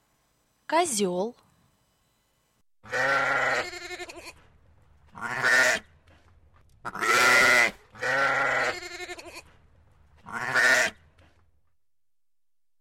Козел и коза

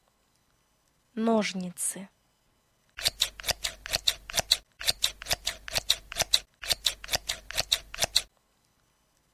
Ножницы

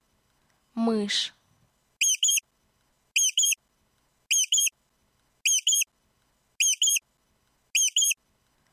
Мышь

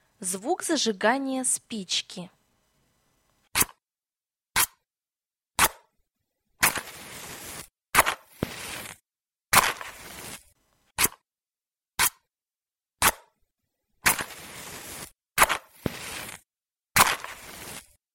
Звук зажигания спички

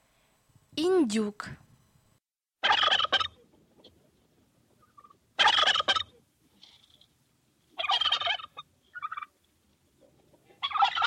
Индюк

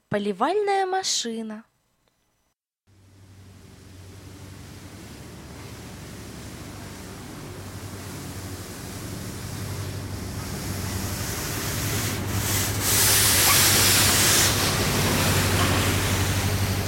Поливальная машина

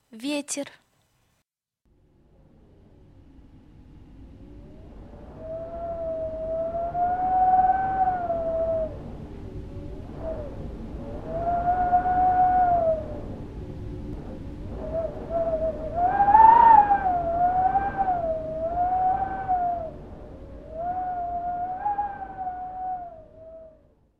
Ветер

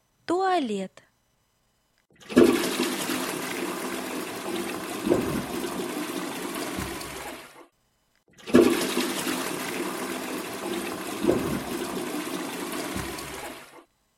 Туалет

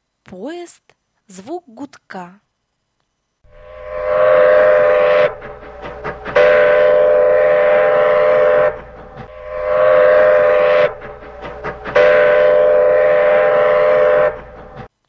Поезд звук гудка

